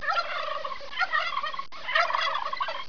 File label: turkey; 12000 Graphics Pack - A Complete Graphics Collection For Your PC